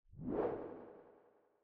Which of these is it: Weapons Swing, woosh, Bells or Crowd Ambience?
woosh